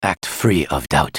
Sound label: attack; BlindMonk